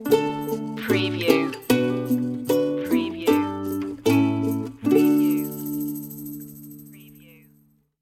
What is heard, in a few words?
deck the halls with ukulele stinger